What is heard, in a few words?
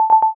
alert